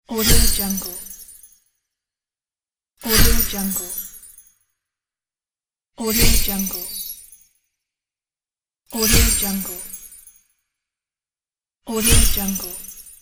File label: magic explosions